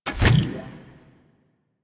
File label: Hydraulus Enter; Warframe; Bard Quest; Music Hydraulus